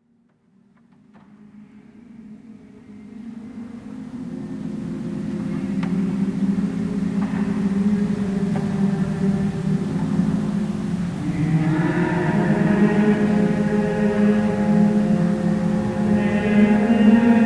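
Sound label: Vespers at Santo Domingo de Silos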